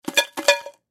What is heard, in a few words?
Peanut Jar Shake, Peanut Jar Half Empty Shake Hard Metal Clanks; Food; Foley; SoundEffects-Blastwave